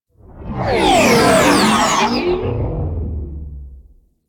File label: Railjack Sentient Ship Close Fly By; Warframe; Enemy Ships; Space Fighter Sentient Coil Agent